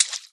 footstep water; Scrat; Ice Age: Scrat's Nutty Adventure; PS4; Stadia; Xbox Series X/S

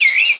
bird random

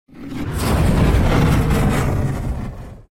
Magick Whoosh

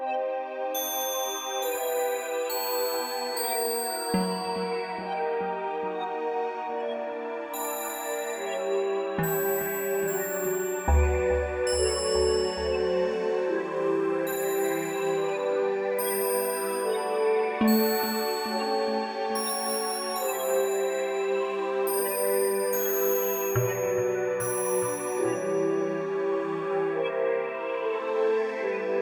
Gnome Ambience; Plants vs. Zombies Battle for Neighborville

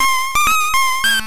Link Error; famista 3; gb

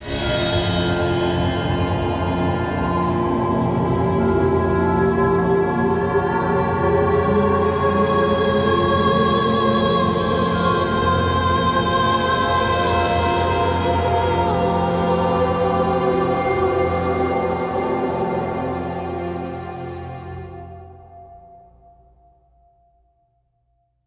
Generic Ambient Combat End; The Darkness 2 gamerip 2012

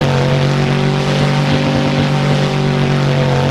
Final Drive, monster truck; Monster Truck Destruction; Android

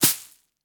dirt drop debris scatter earth

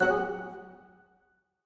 Goblin Rise

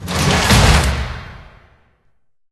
Map Gate Close

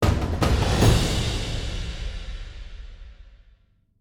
Combat Stinger

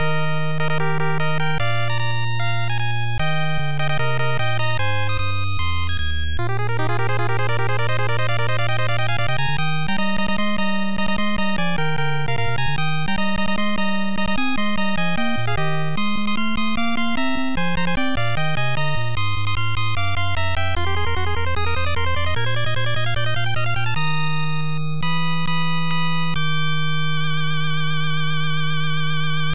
All Clear; Higemaru Makaijima Nanatsu no Shima Daibouken; nes

